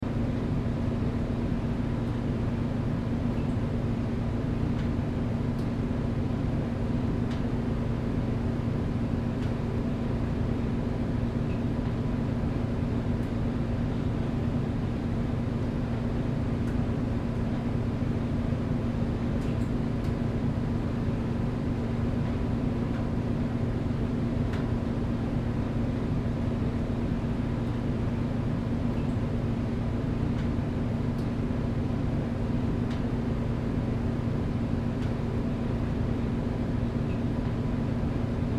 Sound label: Sauna Room Tone Fan Water Drips Wood Creak Loop; SoundEffects-Blastwave; Sports